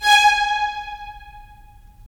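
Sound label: strings violins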